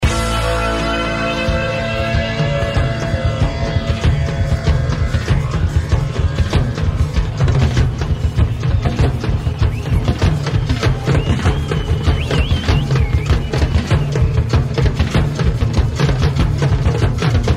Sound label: Drum Duet (live)